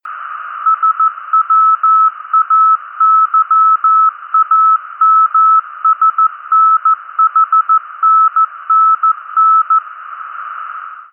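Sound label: SWAYAM, satellite; AIRSPY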